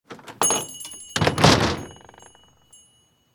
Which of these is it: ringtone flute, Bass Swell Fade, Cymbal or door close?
door close